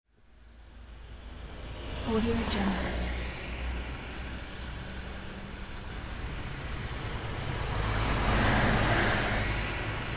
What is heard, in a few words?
city traffic after rain; darksidemarket